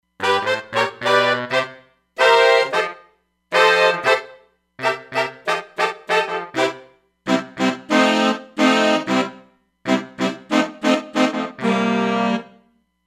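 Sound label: Sax Section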